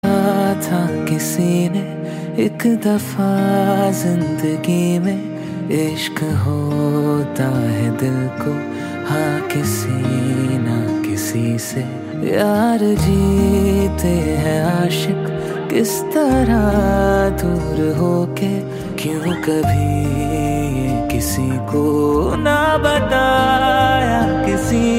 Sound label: HINDI SONG RINGTONE